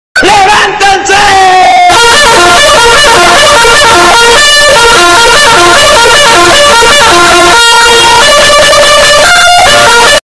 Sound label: loud goofy sounds